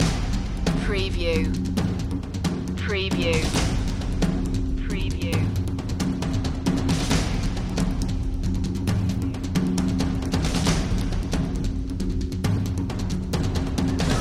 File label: crystal cavern percussion loop